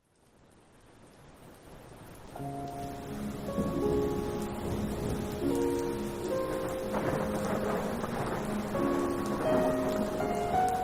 raindrop classical piano thunderstorm ambience; shadowatnoon

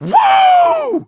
wooo

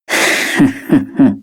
laugh

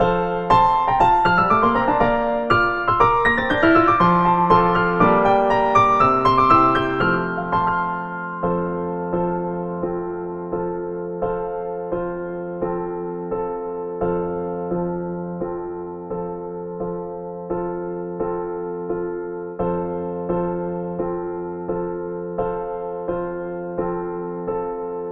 radio exercise

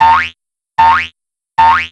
jumping; william

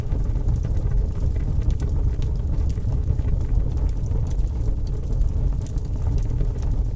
fire, ambience

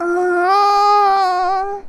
uuuuuugh

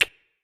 magatama kiss

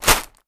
flower fall

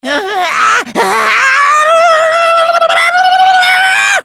transform; monokuma

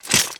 metal

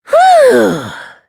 hoo; monokuma

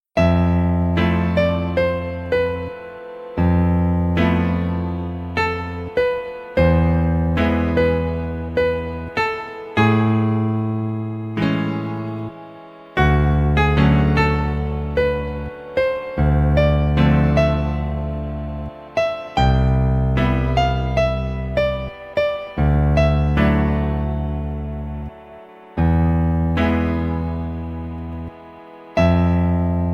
guitar's serenade (piano version)

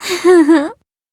despair laugh

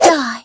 stab

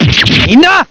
stab; neku

